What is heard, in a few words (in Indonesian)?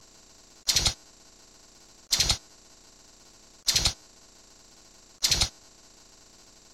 suara pijantung telinga kuning